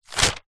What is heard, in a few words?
foley notepad page turn thin paper a4 push forceful finger